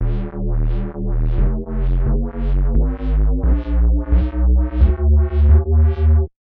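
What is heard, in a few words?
B Flat harmonic minor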